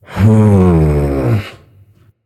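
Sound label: non verbal thinking, voice; cernunnos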